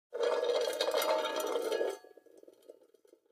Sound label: Coffee Can Rolling On; barrels buckets & cans